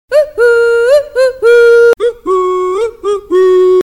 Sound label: woo hoo ringtone, Funny